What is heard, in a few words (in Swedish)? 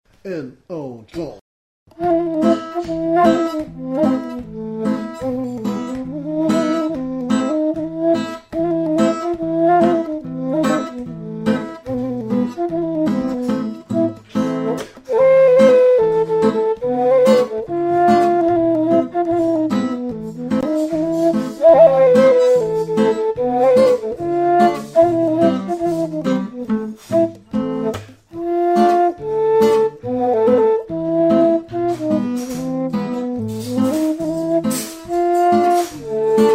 schottis